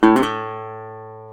GTR, Dobros, National

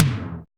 aggro tom; Drums In Your FACE!

